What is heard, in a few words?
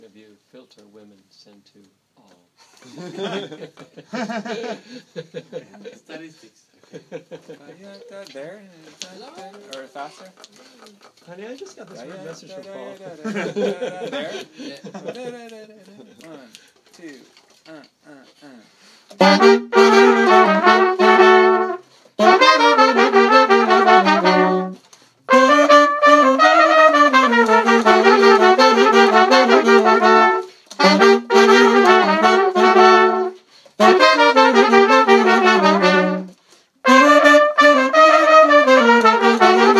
Cocek melody sectional rehearsal; Seattle